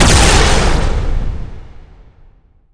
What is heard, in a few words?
damage protection